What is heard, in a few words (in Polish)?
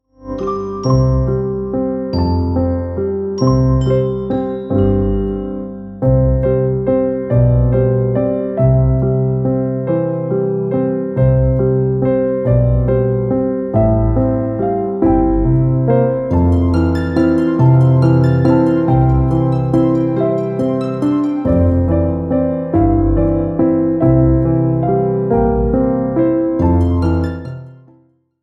mamusiu tatusiu bez voc